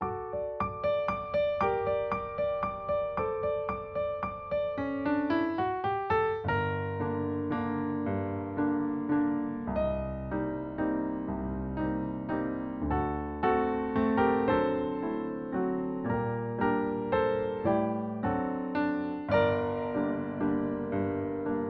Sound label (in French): BC 3x4 Valse lente Sol mineur, ballet music